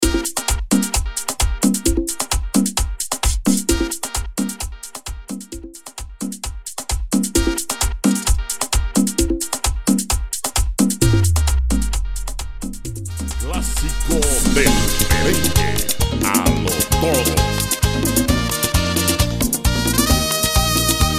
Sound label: merengue intro outro break 131 to 146 BPM; Clasicos Del Merengue 1; Meddley